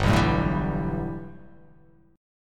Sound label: G 6th Add 9th G, chord